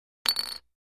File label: Poker Chip Drop, casino games chip mvmt